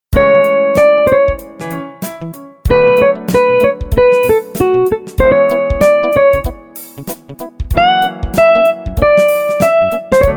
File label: Funk; Coolpad